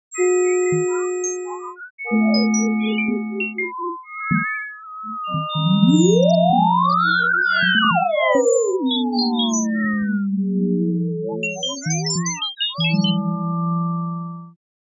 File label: line 2 sine